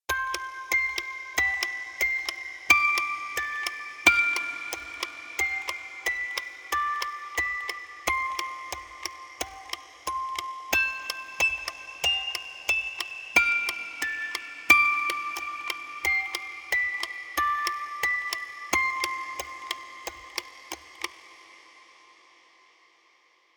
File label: Triggered Guitar; SparkVerb